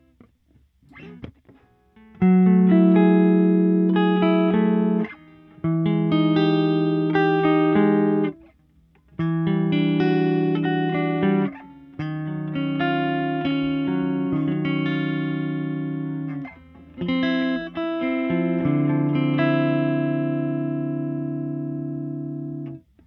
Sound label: Fender Twin Reverb